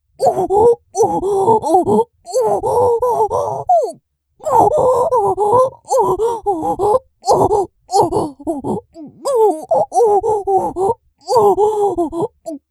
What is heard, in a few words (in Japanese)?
おっ！♥おっ！♥おっ！♥おっ！♥